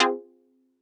stab grit, stabs

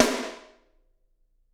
SD, Drums; Bob Clearmountain Drums I

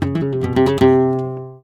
Guitar, Flamenco, c; Best Service ProSamples vol.46 - Flamenco; Frase

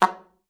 SLPB F#3, ALTOSAX; Xsample VOL-2

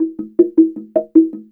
SLIT DR PH, SLIT DRUM; Spectrasonics; Heart of Africa 2

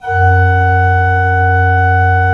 F1, Cathedral Organ; ILIO; Propeller Islands

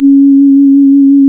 C#, Synclav FM, WIND; Big Fish Audio

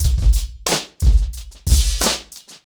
90BPM, acoustic drums; The Roots Drumloops

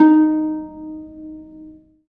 STR HARP, STR Orchestral

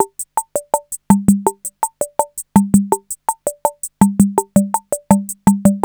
66 MAMBO, DrumBase5000, TR-66; Crypton - DrumBase5000

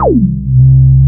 BAS, Eurotech; Sounds Good

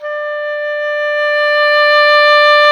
oboe, Roland; Oboe Dry; Roland L-CDX-03 Disk 1; Oboe 4-8

